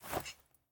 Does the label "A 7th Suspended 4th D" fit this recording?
No